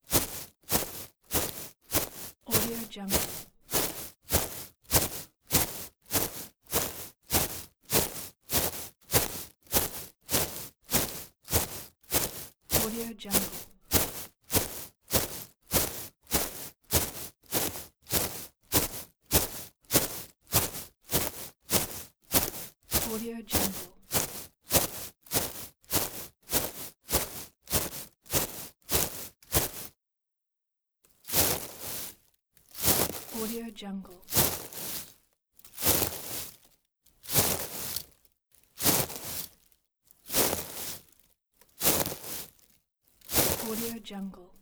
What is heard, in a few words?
footsteps on grass